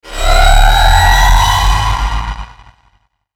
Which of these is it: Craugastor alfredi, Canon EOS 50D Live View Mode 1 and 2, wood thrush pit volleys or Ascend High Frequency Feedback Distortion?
Ascend High Frequency Feedback Distortion